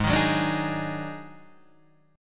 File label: Eb Minor Major 7th Double Flat 5th